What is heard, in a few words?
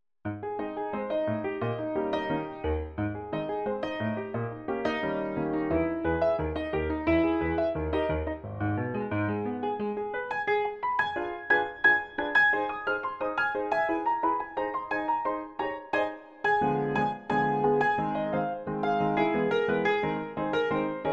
maple leaf rag, pianist